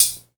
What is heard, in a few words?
HH, Dance Mega Drums; Best Service; HH-HIP